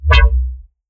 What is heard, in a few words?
FM Bassline